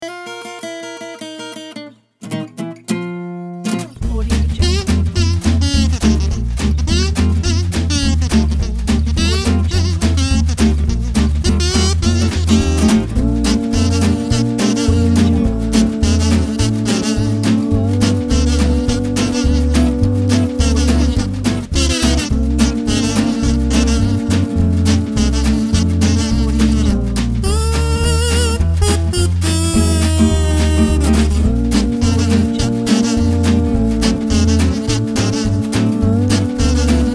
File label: funny swing